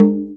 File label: arabic perc, Arabic Percussion Kit; Arabic Percussion Kit 3